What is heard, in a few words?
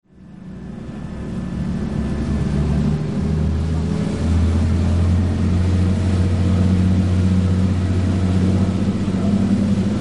bus